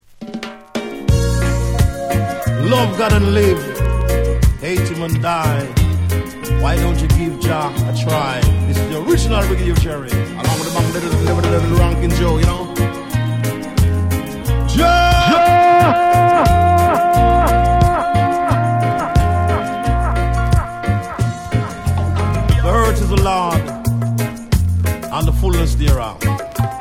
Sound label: Meditation Chant; Stones Throw